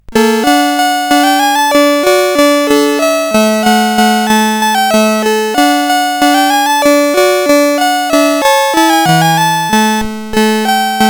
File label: sid CSG8580R5, GAMES; Firequest